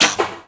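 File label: crossbow; allods2d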